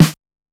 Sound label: Snare Mid, classic machines dx